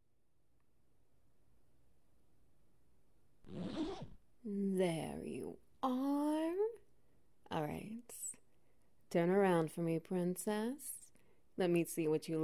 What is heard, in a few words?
SFX Wet Noises